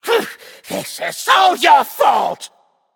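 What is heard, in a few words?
non-verbal boohoo; smite; voicepack; jingwei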